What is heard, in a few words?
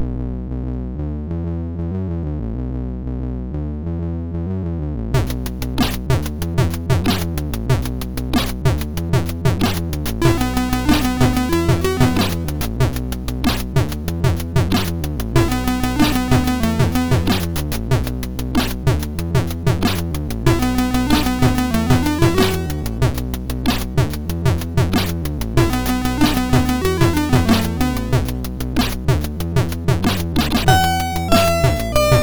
First Shower tune,sid MOS6581R2